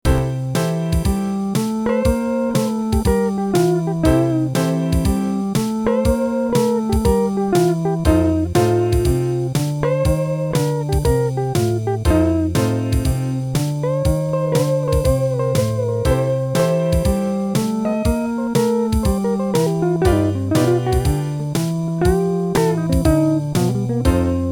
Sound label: MIDI blues